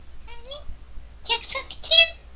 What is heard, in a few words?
alert